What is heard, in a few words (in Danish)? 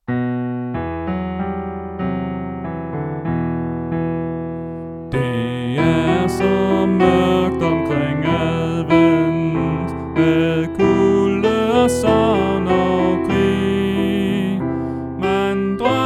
Sopran; oevefiler